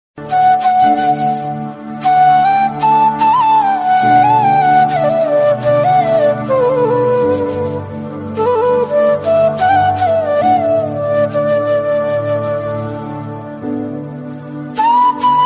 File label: viral basuri sur music